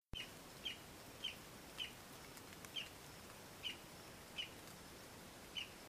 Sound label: todus subulatus jaragua national park dominican republic; West Indian